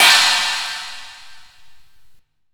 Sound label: Chinese Cymbal, Percussion; realdrum